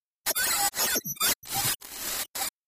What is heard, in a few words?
Static Space Sci Fi, communications